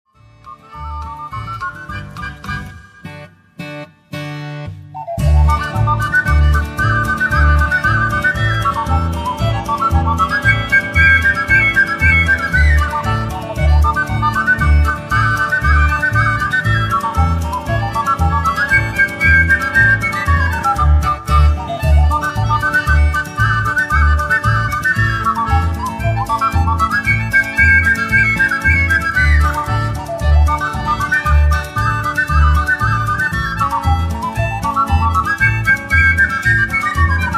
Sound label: clarinet